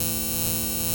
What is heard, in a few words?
FLUORESC BUZZ; Spectrasonic Distorted Reality 1; BUZZ + HUMZ